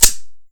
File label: fiveseven slide pull; weapons; fokku tc usp